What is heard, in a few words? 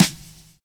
BRUSHING; Univers Sons